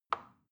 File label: plastic button tiny release soft